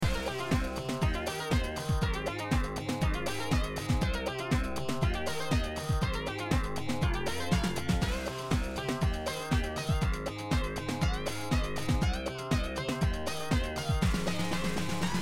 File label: bass basstone lead sound beat